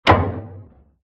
metal metal hit

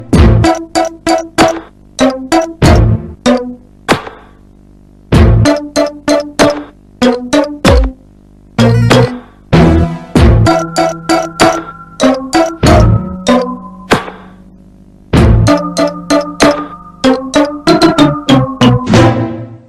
gamecube startup; luigis mansion

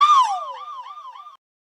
woo reverb

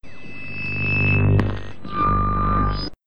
voice trumpet; teletubbies

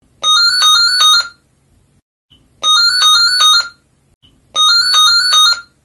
ding ding ding

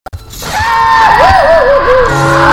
loud laugh

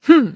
hmm

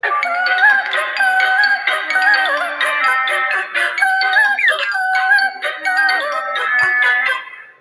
cute thing singing

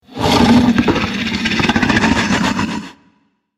growl

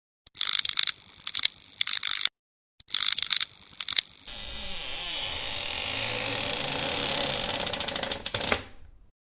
light flickers door creaks

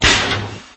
thermal detonator attach; lego star wars

